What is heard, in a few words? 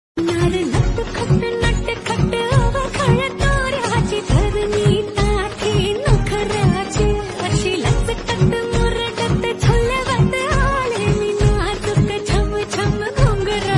Marathi Song Ringtone